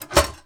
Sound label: DOOR Metal Hatch Close; mono; Universal Sound FX; DOORS & GATES